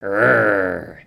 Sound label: Dragon Emote